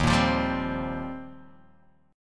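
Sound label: E flat 6th Add 9th E flat, down, Guitar, DADGAD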